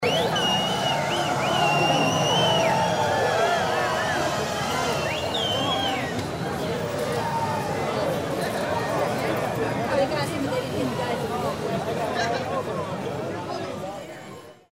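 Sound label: noise of New Year night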